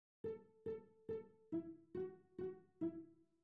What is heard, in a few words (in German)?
Rhythmus Ubungen; Notenlesen lernen